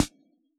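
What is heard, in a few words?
snare drum 78, Yamaha DJX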